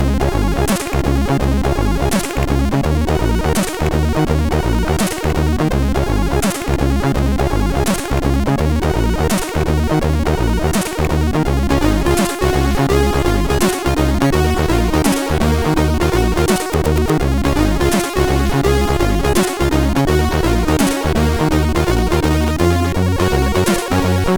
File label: Slow Down MOS6581R4; hvsc